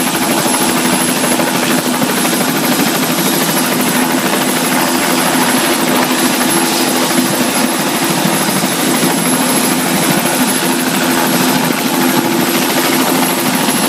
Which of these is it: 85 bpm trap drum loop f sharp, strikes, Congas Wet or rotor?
rotor